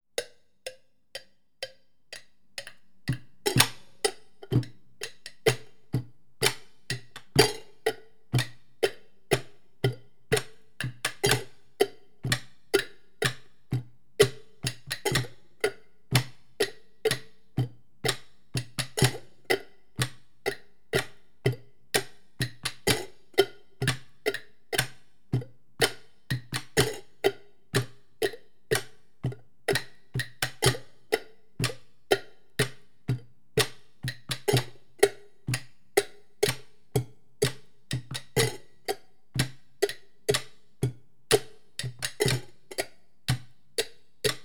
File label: Object as Instrument Spicey Glass Container; Jamuary